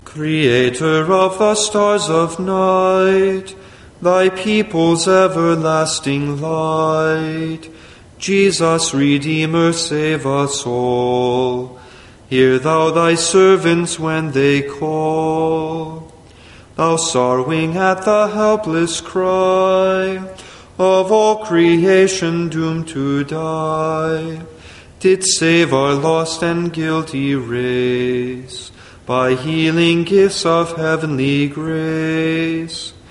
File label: Hymn conditor alme siderum